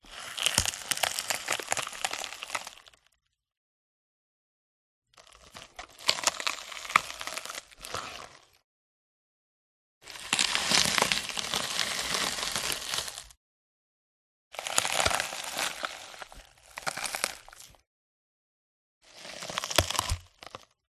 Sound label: mush crunch short watermelon; audiotank